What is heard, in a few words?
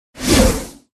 dog buff blue out